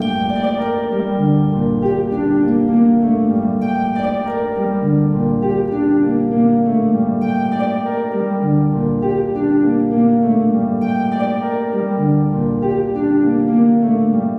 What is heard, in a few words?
Harp; MysterySeller; Greak Memories of Azur; ps4 ps5 switch windows xbox one xbox series xs